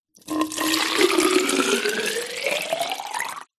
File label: food drink water pour into plastic flask